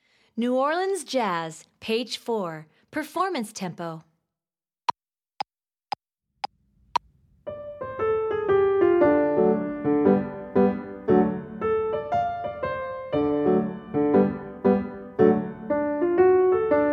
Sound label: New Orleans Jazz (Performance Tempo)